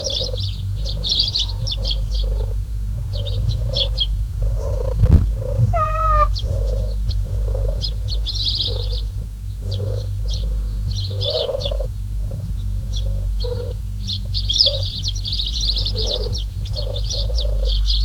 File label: background soft morning, birds chirping